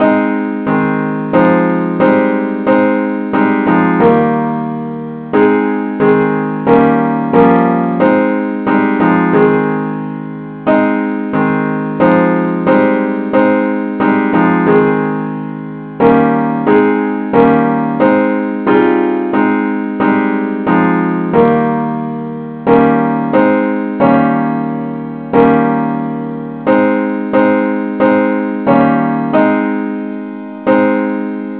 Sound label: advnce acc key aes